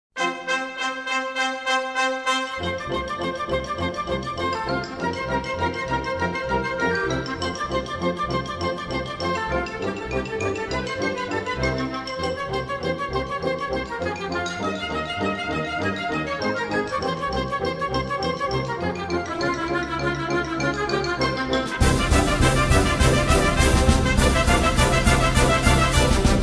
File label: Backing Track